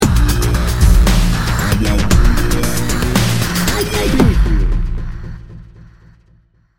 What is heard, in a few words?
stalker menace sting bumper